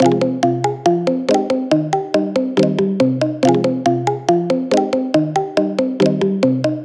Melody F 140, Hip Hop and Trap; Knocking; Ghosthack

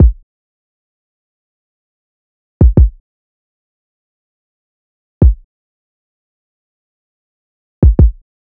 Drum Loop, 92 BPM Kick; Loop Stems; Cymatics; Vibes II Drum Loop Collection; Freefall Drum Loop

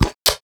smack, Cartoon impacts and smacks

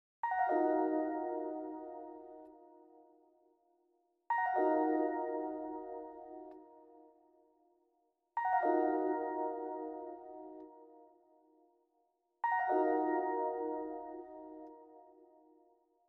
118 BPM D# Min Keys Halftime; Loop Stems; Cymatics; 2022 Melody Collection Platinum Expansion; Miami

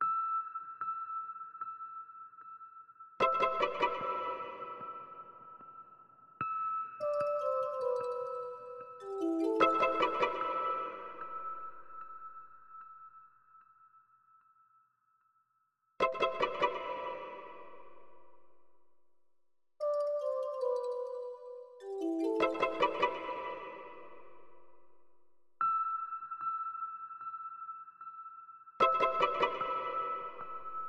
75 BPM A Min Pluck; Melody Loops; Nationals; Retro Collection; Cymatics; ANALOG EVOLUTION Beta

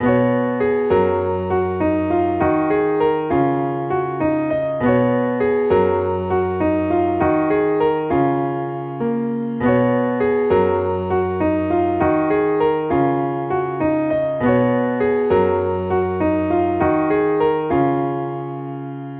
Piano Loop 100 BPM F# Maj; Instruments; Piano Loops; Scarlet; Cymatics; EDM Starter Pack

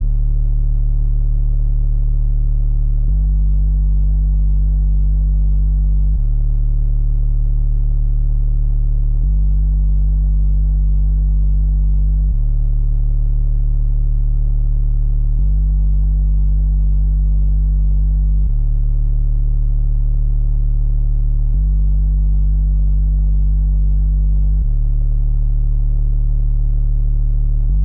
78 BPM D Maj Bass, Lofi; Melody Loops; Making The Bed; Cymatics; ANALOG EVOLUTION Beta; Lofi Collection

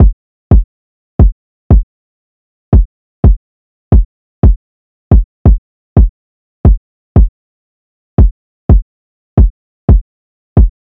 Drum Loop 177 BPM Kick; Loop Stems; Cymatics; Mystery Pack Vol 4; Suave Drum Loop

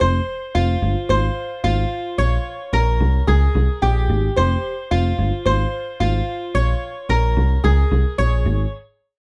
Melody Loop 110 BPM F Min; Humble Yams Melody Loop; Cymatics - Humble Hip Hop Sample Pack

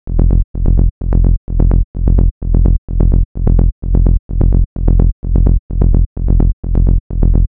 Bass Loop F 128, Melodic Techno; General; Ghosthack; AC2024 - Day 12 - Melodic Techno

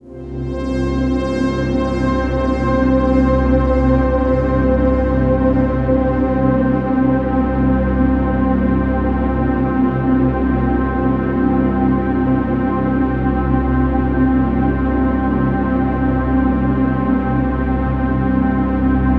Atmosphere C; Ghosthack; Free Male Vocals and Atmospheres

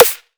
Heavy Snare, Dubstep; Drums; One Shots; Snares; Cymatics; Dubstep Starter Pack

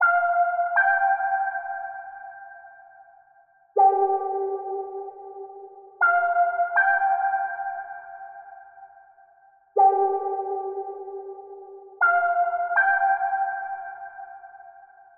Womp Lead, 160 BPM C Min; Stems; Cymatics; Aquamarine

